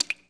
drip; school; Tony Hawk's Project 8; XBOX; inschool

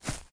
thug fs grass, footsteps

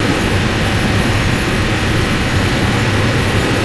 engine; Star Wars Knights Of The Old Republic II; XBOX

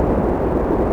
canyon wind; ambience; Soldier Of Fortune II Double Helix; XBOX; kamchatka

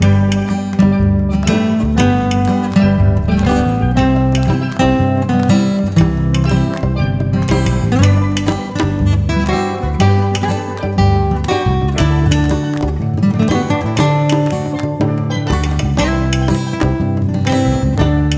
payoff music; Kung Fu Chaos; XBOX

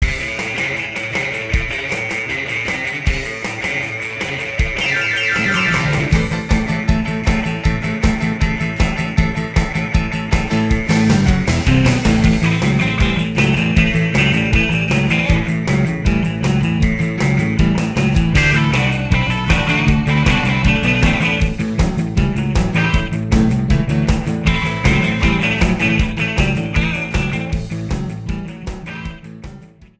funk, Entrances Themes; XBOX; Legends Of Wrestling 1

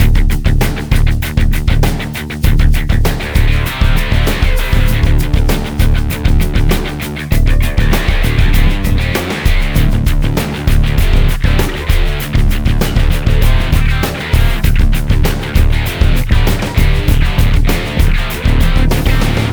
menu bgm

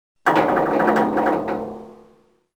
metal stress, ambience; Soldier Of Fortune II Double Helix; XBOX

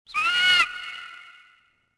creature; pekopeko; Star Wars Jedi Knight II Jedi Outcast; XBOX; ambience; yavin

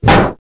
baseball metal hit; projectiles; melee hit; Max Payne

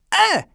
HURT; True Crime Streets Of LA; XBOX

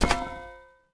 pipe drop dirt; objects; Star Wars Obi-Wan; XBOX

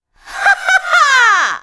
CHEER; TrueCrimeStreetsOfLA; XBOX

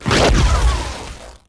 explo sizzly; impacts explos

